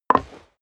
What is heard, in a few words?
step high heels wood creak jump